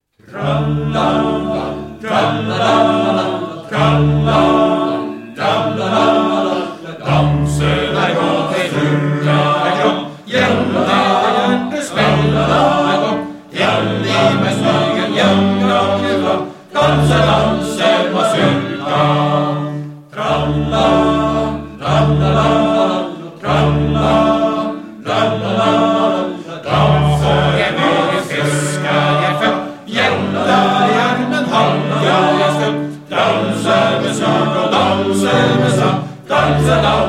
Mazurka

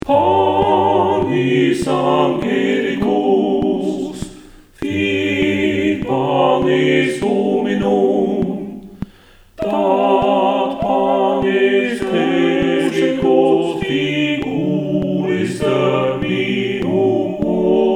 TUTTI Click

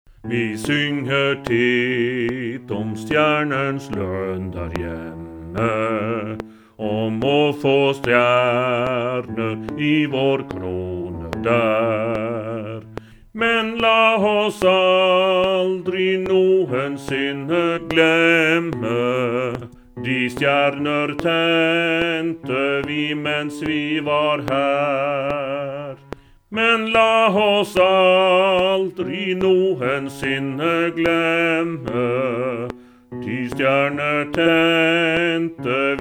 Bass 2 click

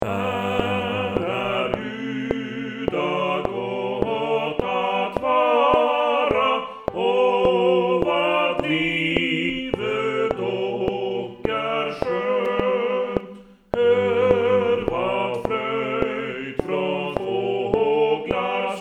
TUTTI PIANO CLICK

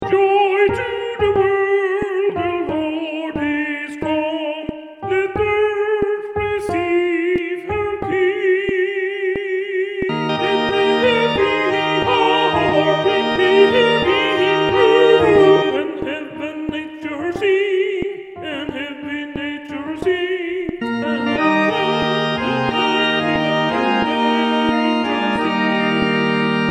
alt click; Joy to the world

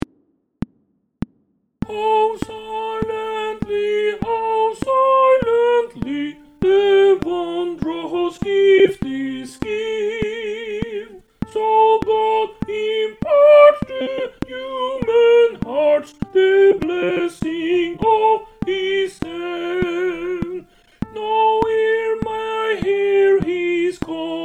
Sopran click

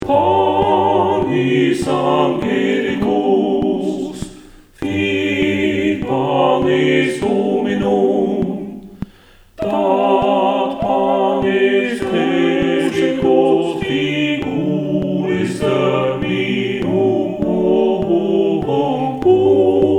TUTTI PIANO Click

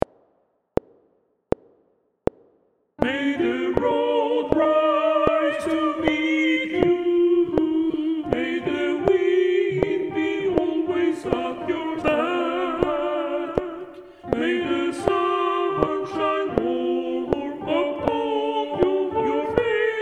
Sopran Alt Click

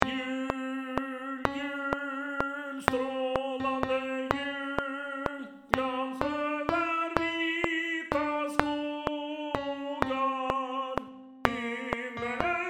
tenor 1 click

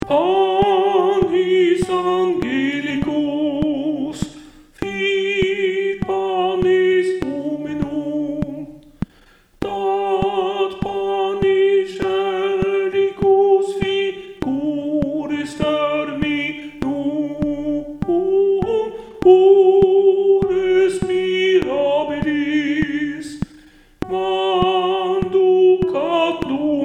Alt Click

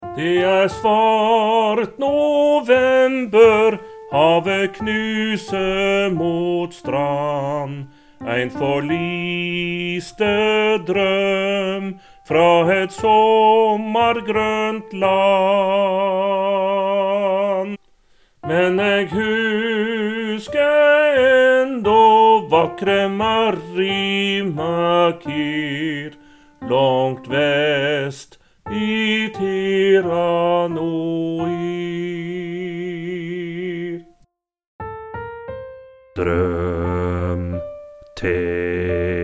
Tira Noir - bass

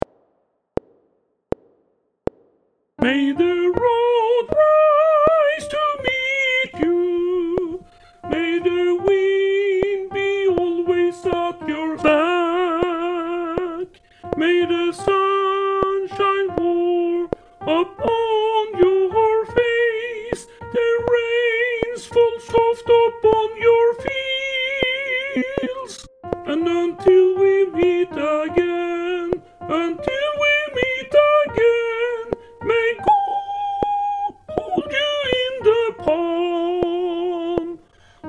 SOPRAN CLICK

